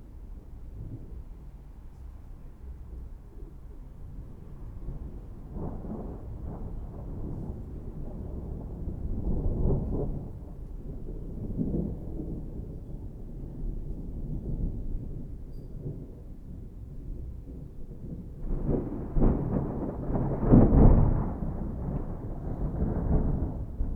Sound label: distant thunder; 99Sounds; Rain And Thunder